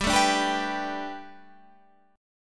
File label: B Minor 7th Sharp 5th G, chord